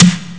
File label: Muffled Tom; rare gems